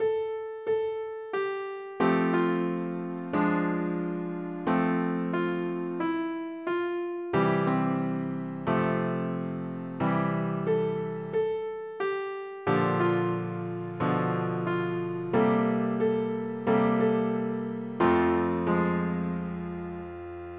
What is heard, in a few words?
piano slow; slow tempo; PlayScore